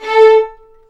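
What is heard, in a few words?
A4 marcato double bass, Arco; East West; The Ultimate String Collection; VLNS ARCO DB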